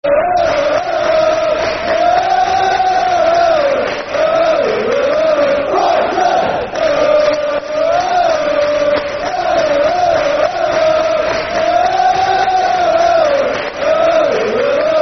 Football Chant (Soccer Song), Brighton; Der, Der Der...Brighton; Brighton Bhafc

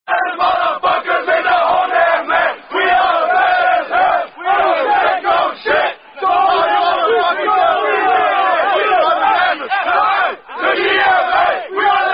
Chant; Sigma Lambda Beta Chant

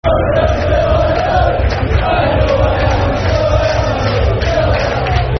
Rochdale Till I Die, Football Chant (Soccer Song)

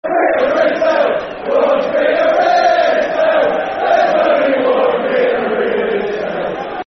Football Chant; One Peter Ridsdale; Swindon Town Stfc

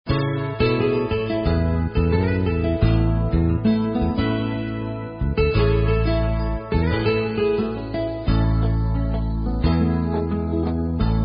Karaoke Songs; Girl Scouts

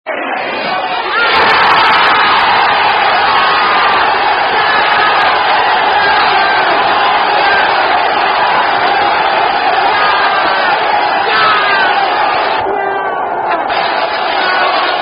Football Chant; Everton Vs United

